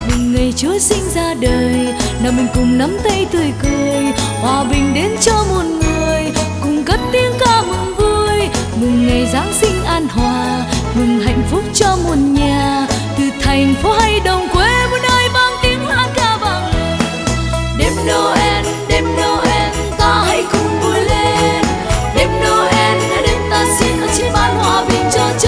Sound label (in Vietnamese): Nhạc Chuông